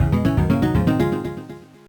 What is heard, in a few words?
Fishing - Amazing; The Sims Bustin' Out; gameboy advance gamerip